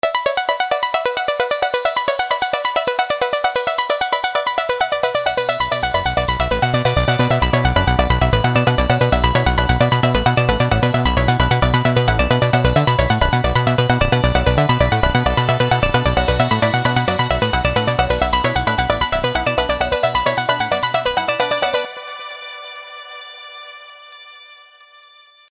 Plucky Things; Vengeance Sound Avenger 2; Generative 1

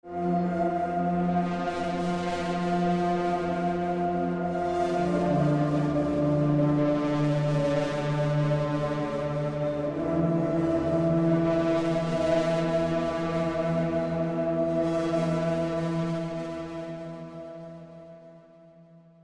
Pads Shifting Paradigms; Natural Forces

